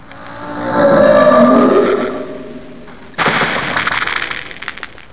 kicked; Zoemtonen hisses pingen woesjes tikjes

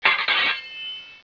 SWORD; swords

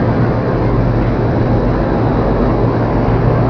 ship medium loop

